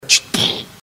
explosion noise; sfx baker

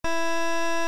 wii error

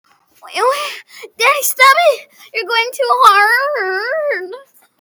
moan; daddy

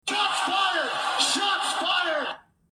shots fired

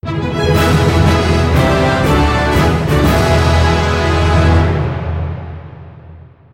level up; final fantasy

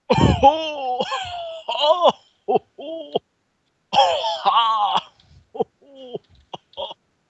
ohooooo

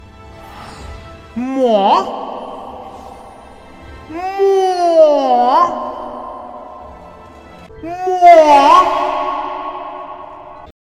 moooooh

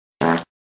classic fart sound

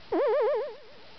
jump

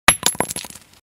grenade plonk; louder; tarkov

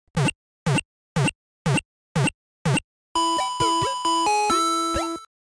original 8 bit healing sound; pokemon red blue

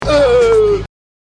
euuuh

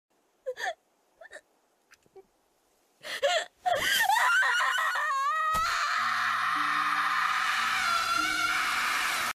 scream

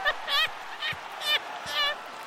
heh heh

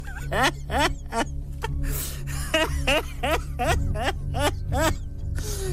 laugh

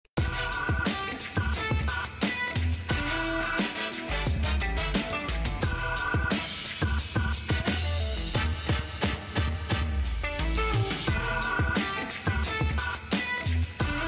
dance off emote; fortnite

